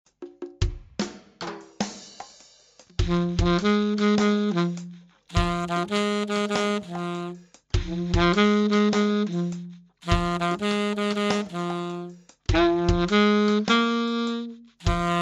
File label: alto